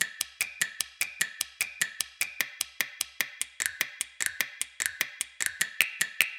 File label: Perc Loop 150 BPM; Future Bass; Drums; Top; Heater